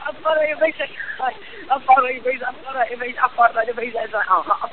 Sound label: singing voicemail; Voicemail Messages